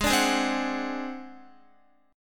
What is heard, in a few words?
Ab 7th Flat 5th Guitar, chord; Ab7b5 strummed; JGuitar